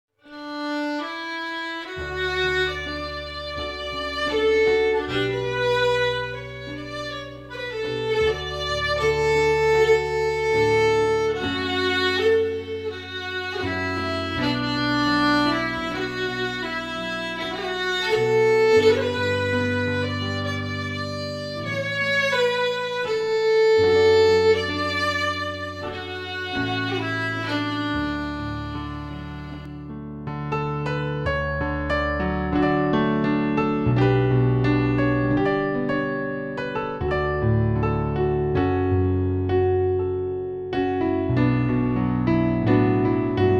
Song for Red - FIDDLE w PIANO; Jink & Diddle Book o' Tunes 2021

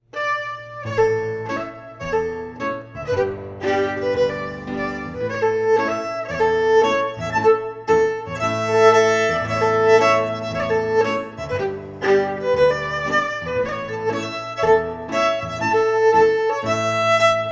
Reel, FIDDLE PIANO; John Macglashan col 1796

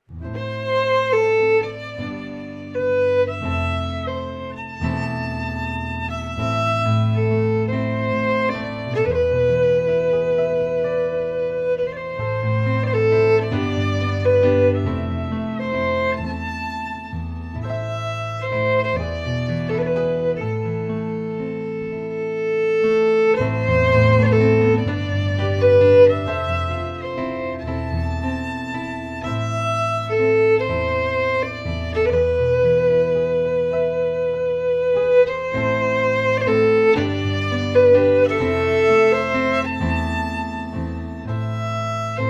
FIDDLE PIANO; Jink & Diddle Book o' Tunes 2021

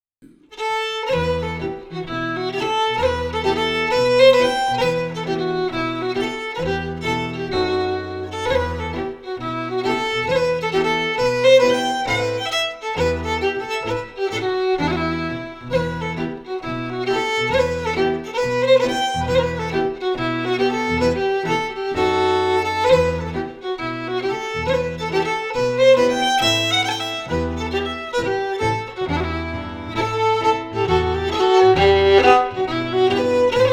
FIDDLE PIANO; Jink & Diddle Book o' Tunes 2021

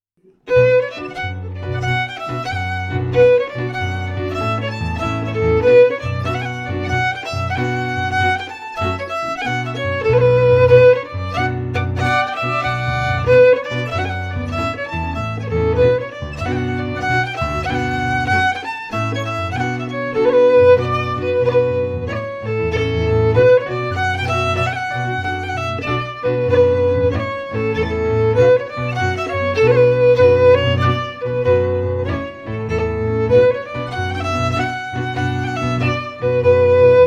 FIDDLE PIANO; Jink & Diddle Book o' Tunes 2021

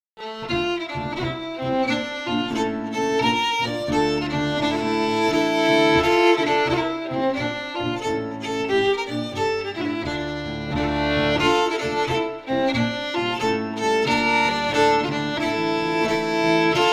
FIDDLE PIANO; Jink & Diddle Book o' Tunes 2021

